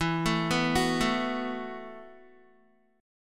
E Suspended 2nd Suspended 4th E, Guitar, 4E,4A,5D,5G,5C,6F; arpeggiated; Esus2sus4; 12,12,9,11,9,x